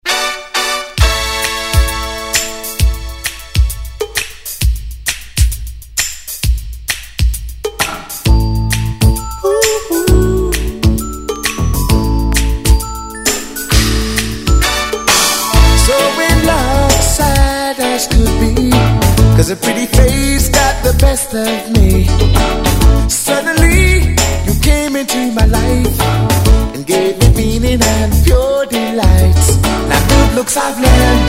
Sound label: REGGAE